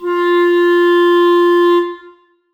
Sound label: clarinet f4; Sonatina Symphonic Orchestra